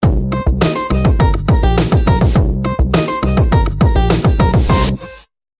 ringtone hiphop

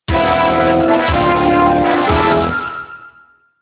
fanfare

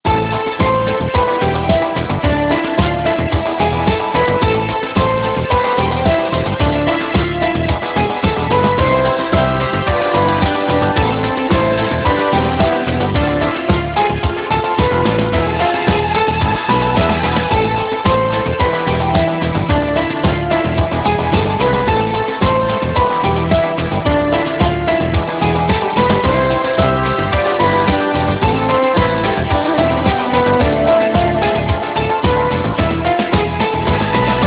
pop, instrumental

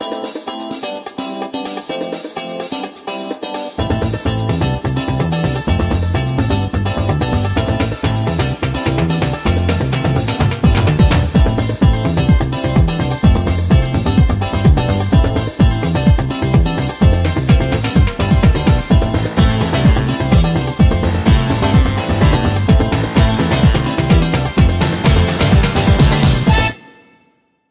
ringtone europop

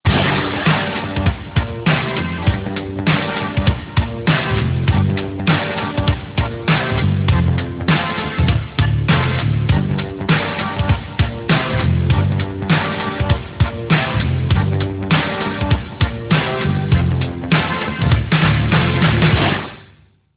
funk, instrumental

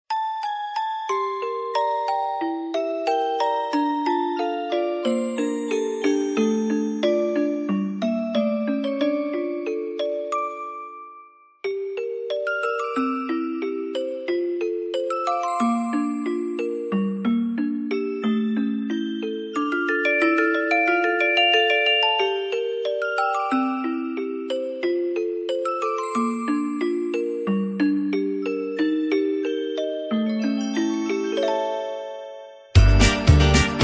minus lofi

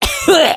male cough; human

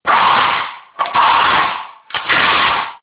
putty, industrial

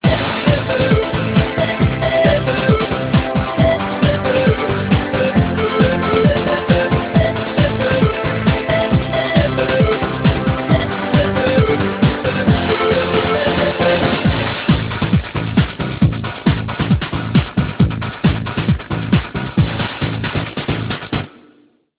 ringtone dance, instrumental

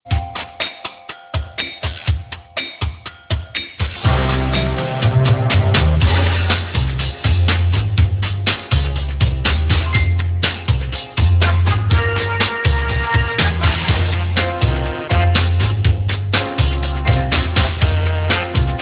jazz, instrumental

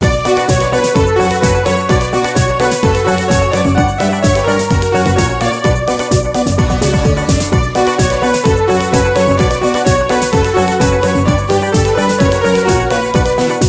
ringtone modern disco

